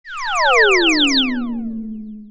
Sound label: Strange Laser Birds Lo; Millennium Gold 2000; Crackanoid